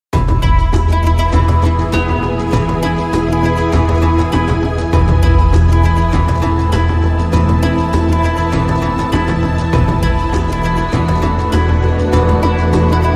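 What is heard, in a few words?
Chinese Chime Short Rhythm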